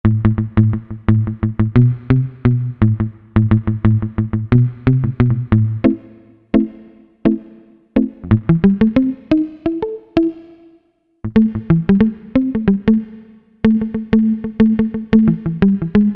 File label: Mute Guitar